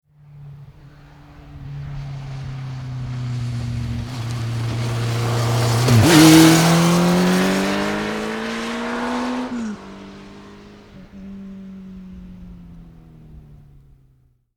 Motorbike Honda 1100 Pass fast; Motorcycle; Tom Hutchings

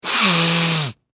Human Male Sniff Hard; Sick

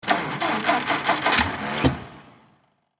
Swamp Jeep Stall; Swamp Jeep Engine; Transportation Watercraft

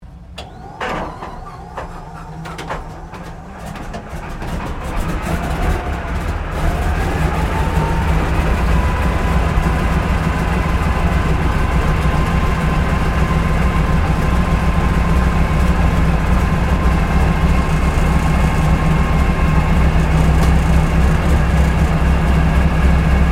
AIRCRAFT AT-6D HARVARD MK III STARTUP INTERIOR; Temora Harvard MK III; Airplanes; Vehicles; Airplane Sound Effects

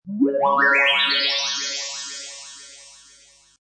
Space Scifi Sound, Sci-fi warp; Space rhubarb; transformation sound; Science Fiction Sound Effects

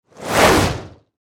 Horror Magic Spell Whoosh Impact

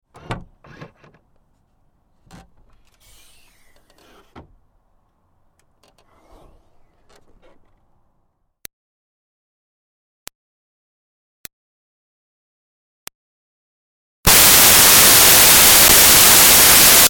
Car Smart Car Hatch Open; 2005 Smartcar Fortwo; Vehicles; Big Room Sound

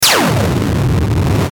Noise Descend Static Warped Laser; Strange Tone; Blastwave

